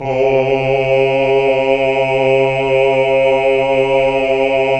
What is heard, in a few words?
Basses; Sound & Vision - Gigapack II CD 1 (Roland); HAL Greg. Basses